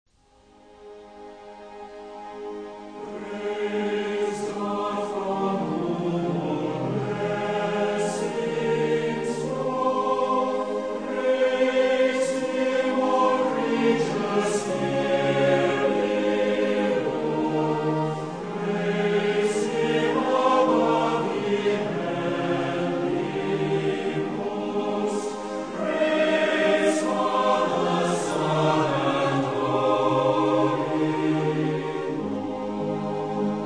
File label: Orchestra and Choir